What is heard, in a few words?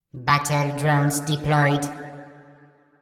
Activated Drone Swarm